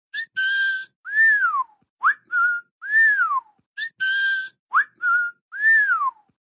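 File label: whistle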